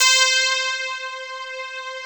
C6, SYNTH; Q Up Arts; Dream Experience; LEMON

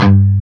CHUG G 1 R, Guitar; East West; Steve Stevens Guitar Samples Collection

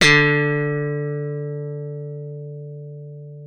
D3, slap thumb; Xsample VOL-1

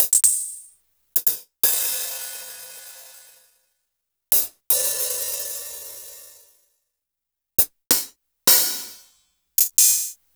Roland R-70 HiHat

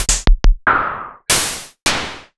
SCI Prophet-5 Drums

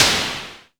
Perc Noise Long, Tama TS-206

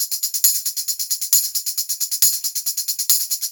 Brass Tamb, Perc Loops; Sonic Foundry; Drum Tools

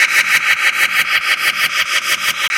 Nothing Shimmer Pulse, Percussion; Beat Components

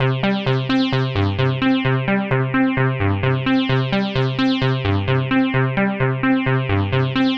130 C synth, Synth Loops, Trance

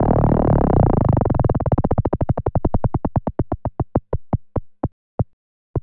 SLOWDOWN, Anime Sound FX; COMIC

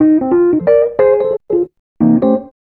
ACID JAZZ

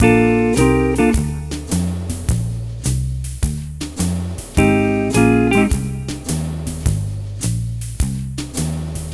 Multi Guitar Swing Mix-A#, 105; Zero-G Kocktail Kollection; Construction Kitz (Lounge)

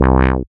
SYN MC WAH, Roland MC-202; Sound & Vision - Gigapack I CD 2

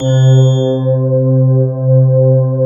PAD, Drum & Bass; Ueberschall; Drum & Bass Resonance

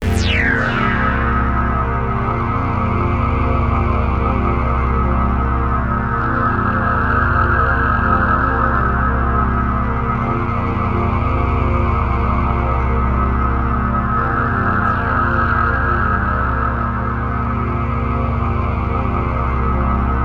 Access Virus B; Synthworx

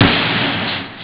super snare; ST-XX Amiga Soundtracker Sample Packs

